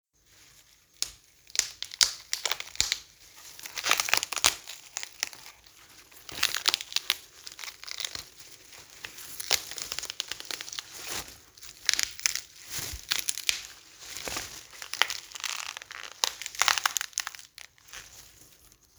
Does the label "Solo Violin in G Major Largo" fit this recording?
No